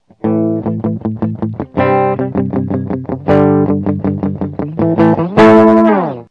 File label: clean